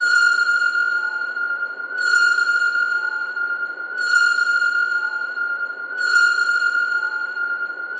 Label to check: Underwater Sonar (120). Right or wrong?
Right